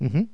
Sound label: uh-huh; Jumble Gems; 501 Great Games - Volume One; Guildhall Leisure Services